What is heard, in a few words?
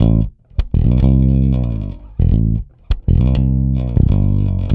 Rock, Bass; Bass X